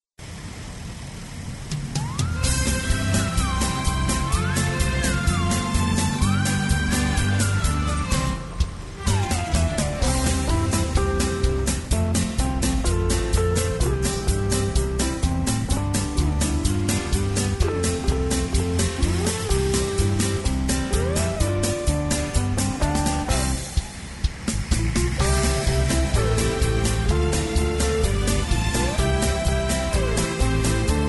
car horns

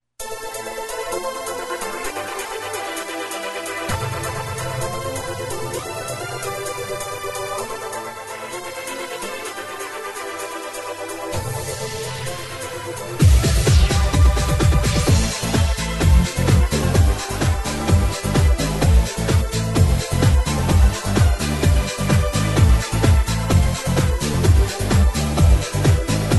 bass; sonic

